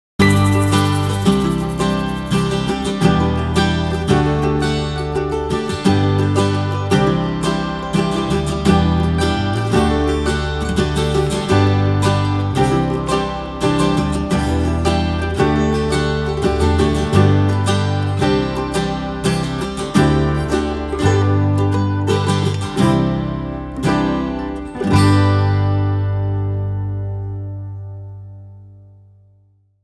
instrumental

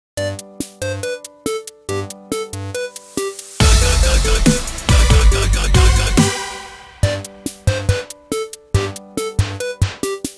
CHIPTUNE

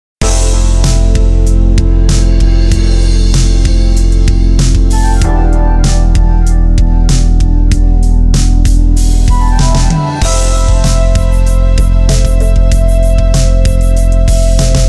WARM FUNK

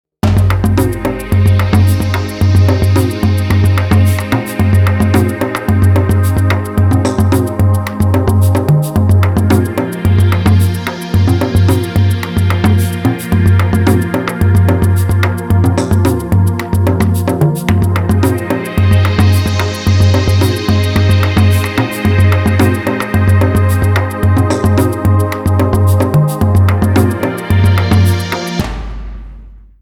DRUMS

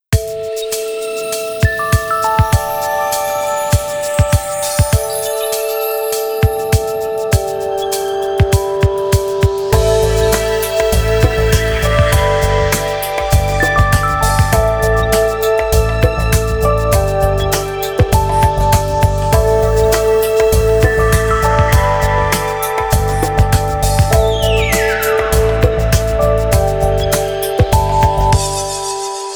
BEACHSIDE BREAKS

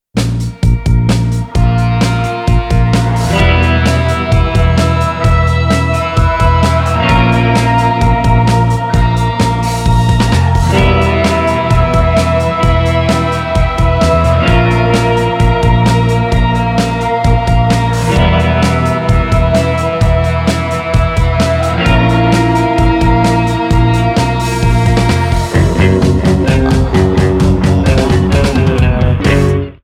GHOUL GROOVE

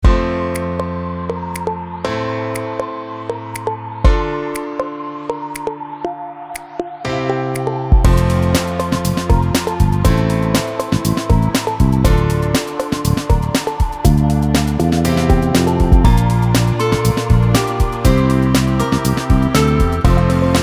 FRESH CORPORATE

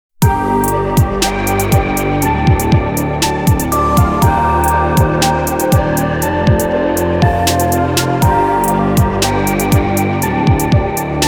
SUSPENDED IN DEPTH

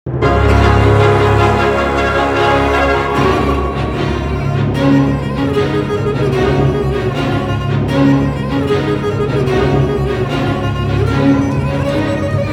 FIRST MOVEMENT

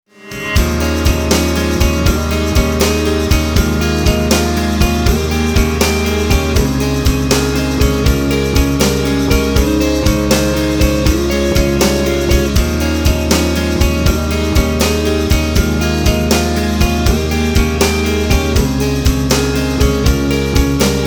Instrumental

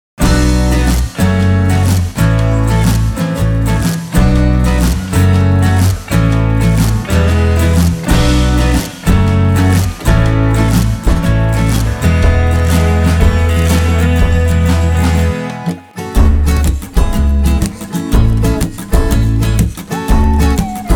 (INSTRUMENTAL)

